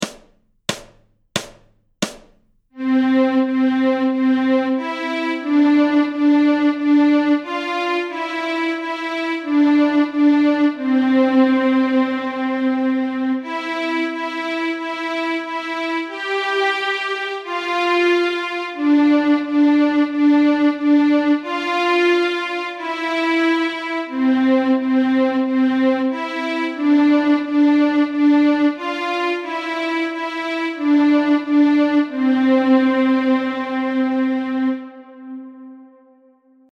Björnen sover Stråk C, Fiol; Notträning

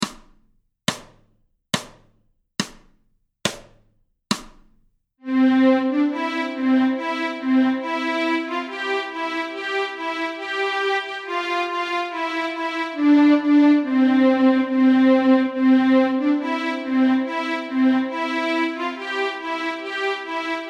Stråk C, Nyckelharpa; Friarvisa; A-strängen Steg 1 Två toner i dur

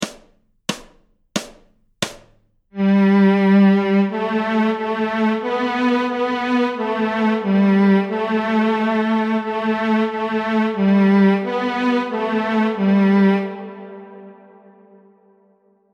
Plankan Stråk G, Fiol

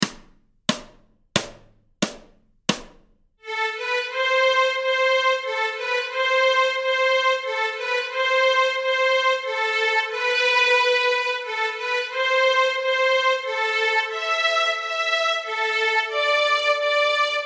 Stråk A moll, Nyckelharpa; Göingen; A-strängen Steg 1 Två toner i dur

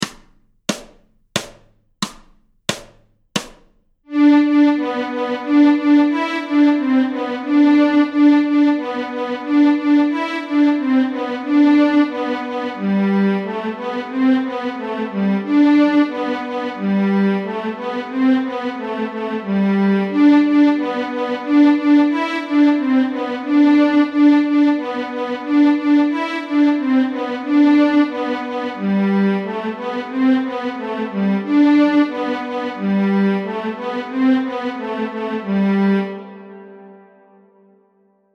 Slängpolska Stråk G, Fiol; Småland; Notträning

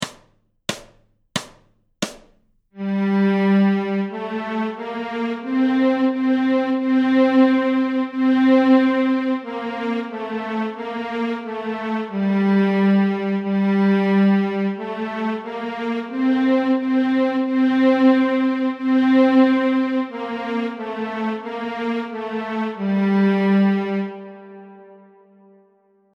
Höstmåne Stråk Gmoll, Fiol

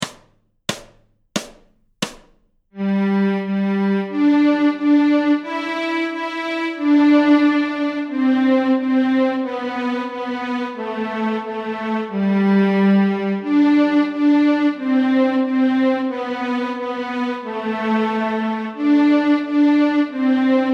Stråk G, Nyckelharpa; Blinka lilla; A-strängen Steg 1 Två toner i dur

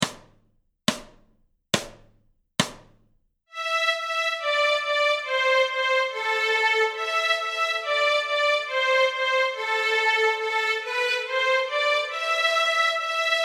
Stråk Amoll, Fiol; Notträning

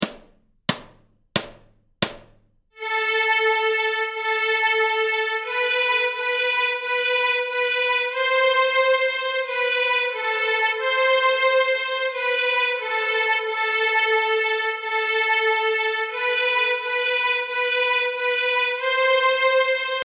Stråk A moll, Nyckelharpa; Danmark; A-strängen Steg 1 Två toner i dur

